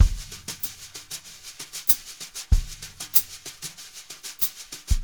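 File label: brush, drumscores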